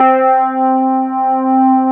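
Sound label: Wurlitzer C4, Keyboards Old School; USB Soundscan vol.09; AKAI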